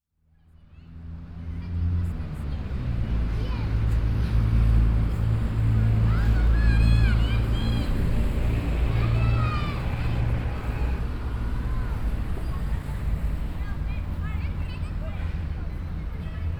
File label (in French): bogota tintal ambiances paralleles